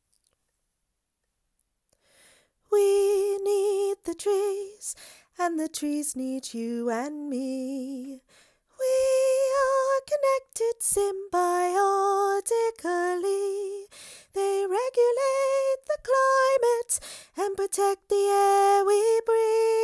soprano

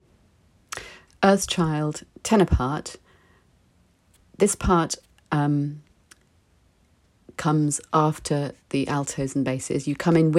Tenor

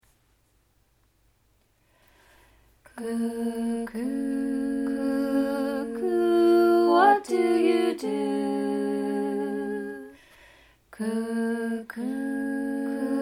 cuckoo all; All Parts

